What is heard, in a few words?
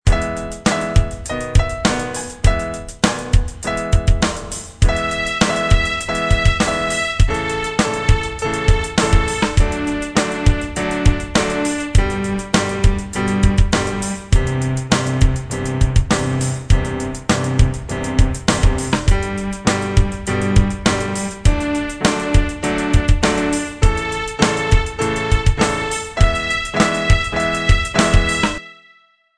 Strings